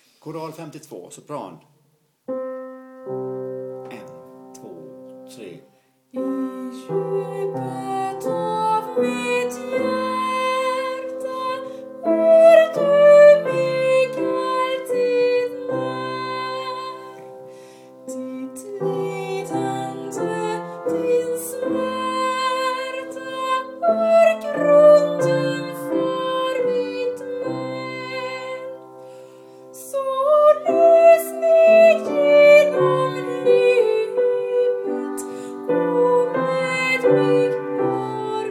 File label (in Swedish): choral sopran